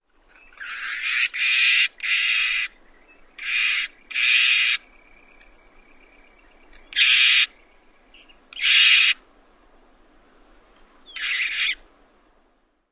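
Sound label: snow bunting harsh scold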